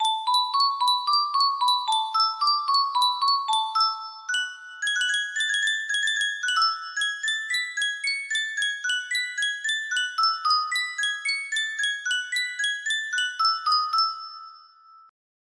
yunsheng music box, music box melody; bach invention no 3

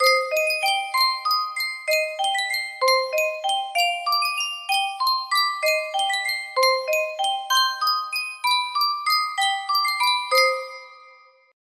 Miniature Music Box; sankyo

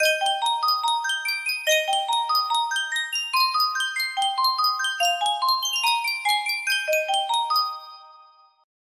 sankyo miniature music box

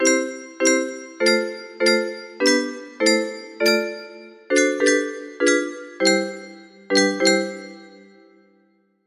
music box, music box melody

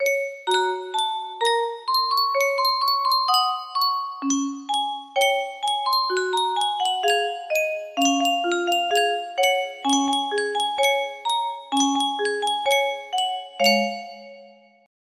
music box, music box melody

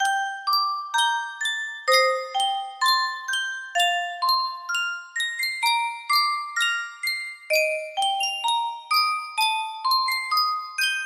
Yunsheng music box, music box melody; alan hovhaness symphony no 50

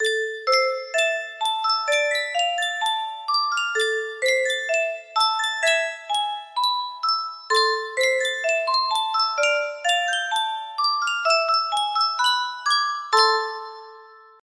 yunsheng music box, music box melody

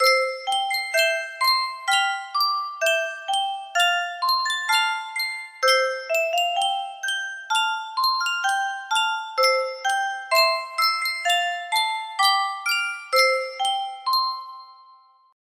Sankyo music box

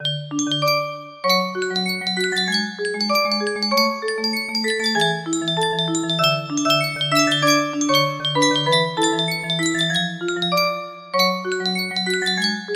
music box, music box melody

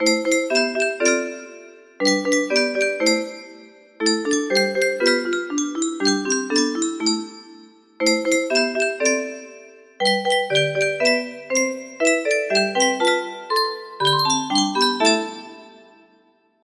melody; undertale

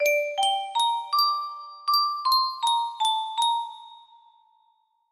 melody

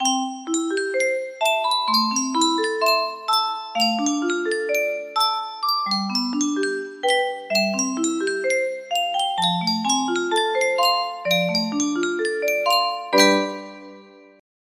music box

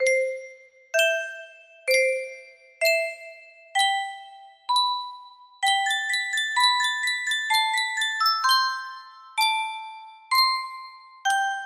sankyo custom tune music box

